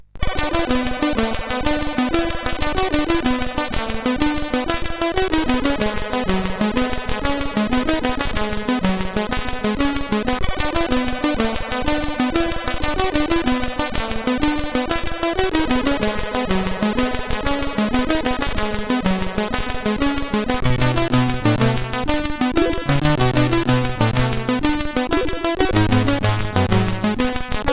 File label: tune sid CSG8580R5; Arachnophobia 07 tune 5